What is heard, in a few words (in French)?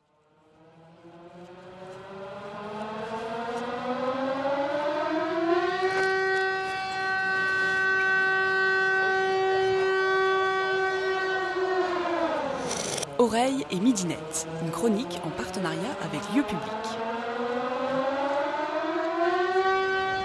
sirene; cie Parnas